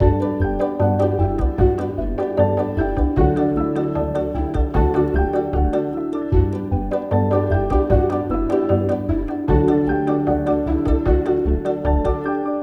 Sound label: Library Chilled